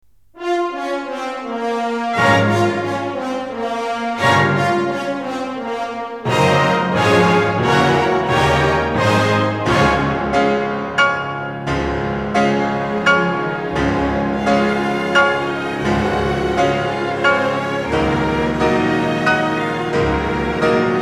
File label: concerto piano; tchaikovski; maazel